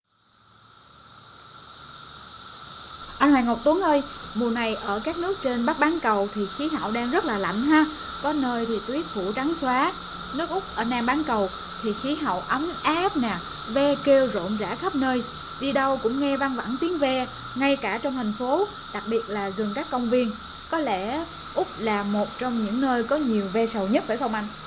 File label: cicadas